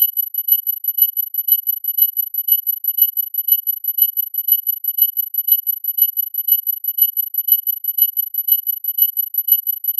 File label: futuristic